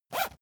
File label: zipper use short fast